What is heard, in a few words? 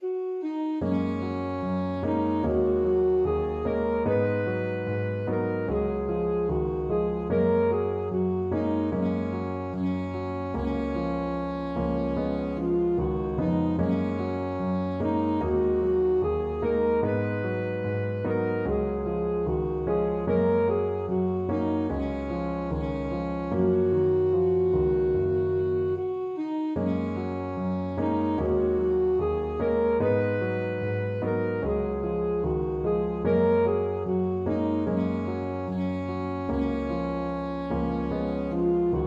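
alto saxophone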